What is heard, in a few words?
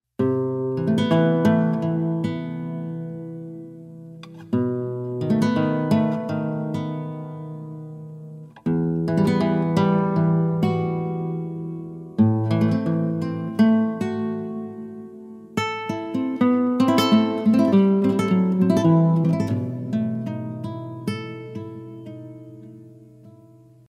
Amazing Chill Guitar; CHILL OUT SUAVE